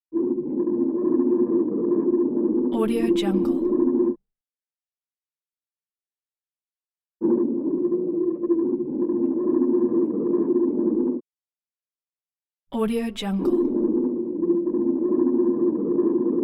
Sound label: bubbles underwater deep rapid bubbling; prosoundfx